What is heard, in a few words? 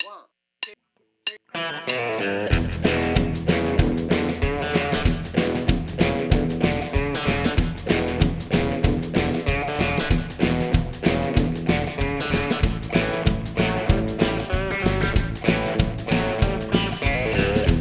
rockabilly, backing tracks; chicken pickin guitar solo